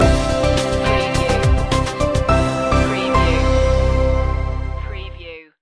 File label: corporate heart stinger